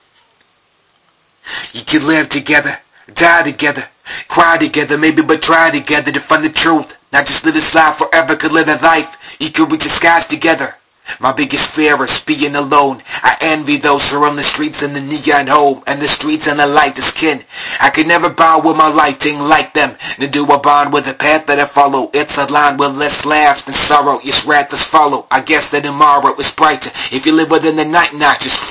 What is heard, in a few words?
Sunday Chimes